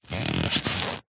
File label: vehicles bicycle tyre squeak creak rub movement; zapsplat